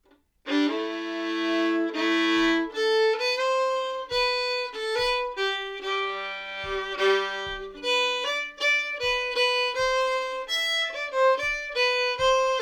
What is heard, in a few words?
slow; Bisonpolskan